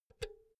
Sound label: power cord pull out quick soft; objekte; elektronik